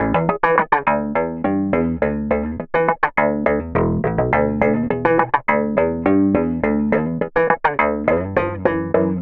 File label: Processed Slap Bass, Electric Bass